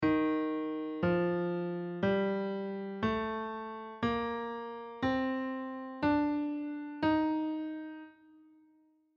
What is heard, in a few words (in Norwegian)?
lydisk